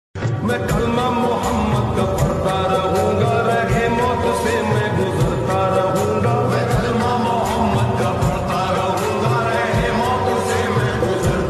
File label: mein kalma muhammad ka padta rahunga naat ringtone